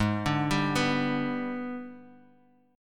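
Ab Minor Major 7th Flat 5th chord, Guitar, Half Step Down tuning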